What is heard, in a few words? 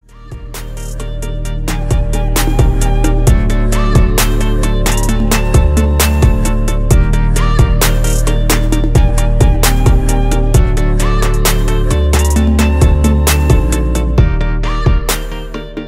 Instrumental